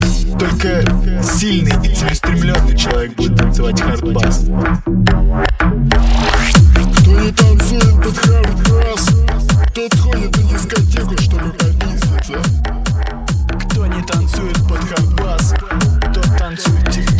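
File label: hardbass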